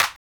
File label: Not Nice Claps